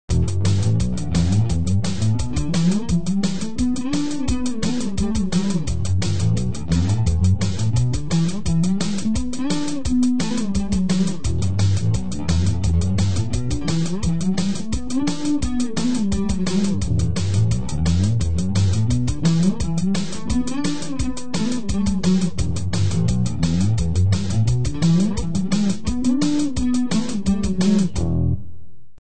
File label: bass